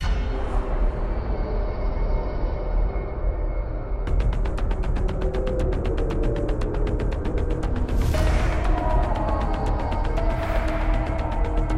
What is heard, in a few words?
Suspense Dark